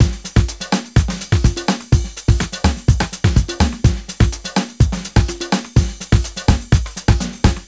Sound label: 125 beat busy, Nu Jazz House; Nova; PowerFX